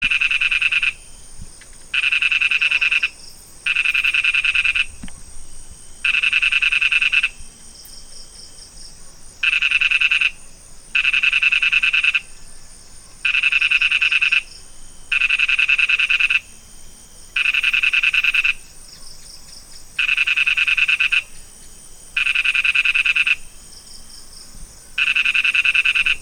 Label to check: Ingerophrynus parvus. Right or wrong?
Right